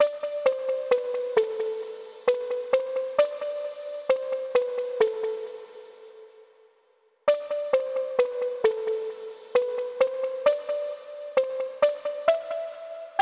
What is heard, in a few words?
Poppin Piano; super crush k.o.